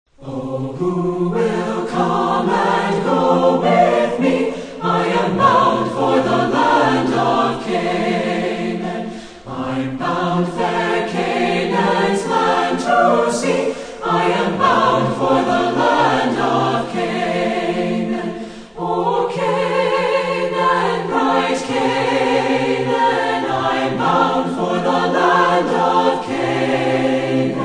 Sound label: Singers And Orchestra